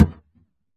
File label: impact Plank medium